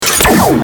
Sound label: attack; FingerGuessingMaster